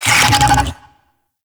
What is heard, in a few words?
Infested Big Ashen Vocal Pain; Warframe